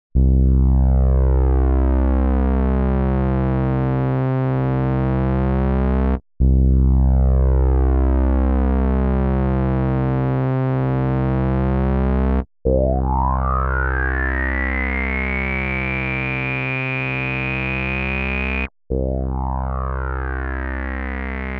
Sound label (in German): MICROWAVE 1 Plugin Klangvergleich Filter REV A und REV B; WALDORF